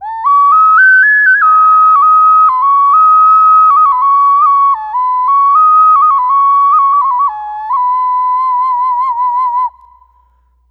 Hi Occarina

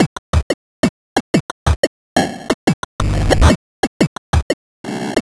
090bpm, Downbeat Dance Grooves

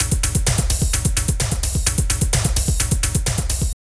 Drum Loops

